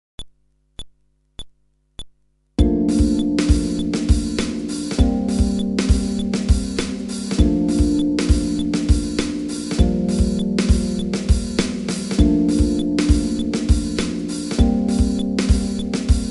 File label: Groove ベース無し